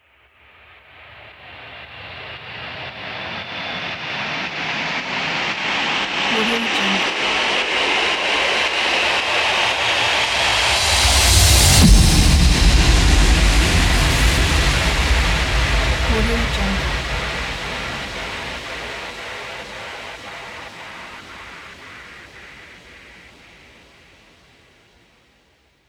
long impact uplifter and downlifter

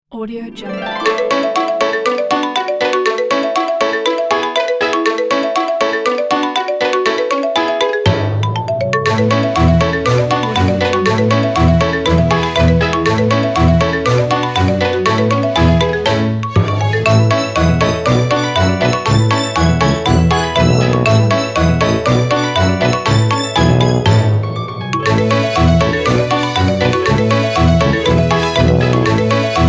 funny kids

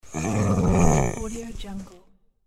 ram

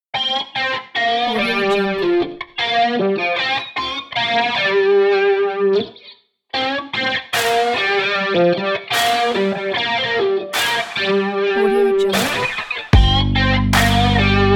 sexy rock beat

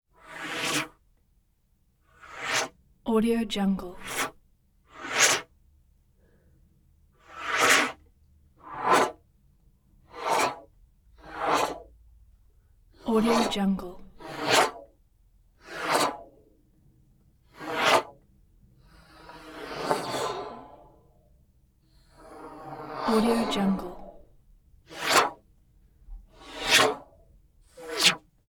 swish by blowing air hissing tubular

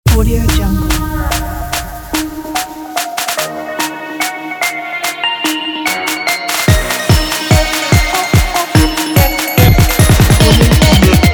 trap

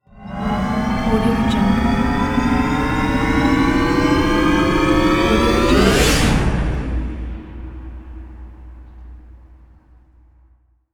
spaceship take off

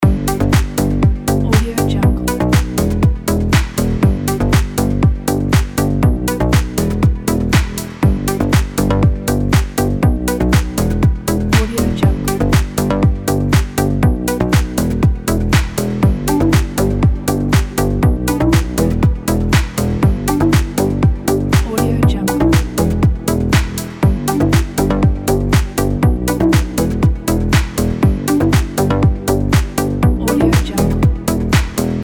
minimal house loop

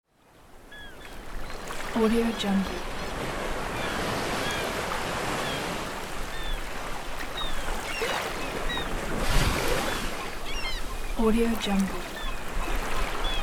beach ambience with waves and seagulls

